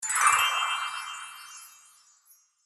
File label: Collect All; The Lucky Fairy